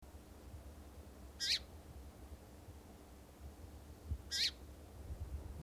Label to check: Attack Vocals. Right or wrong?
Wrong